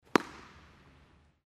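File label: tennis ball flight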